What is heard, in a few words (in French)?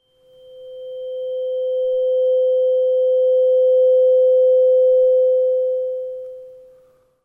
crystal tuner 512hz diapason thérapeutique; medson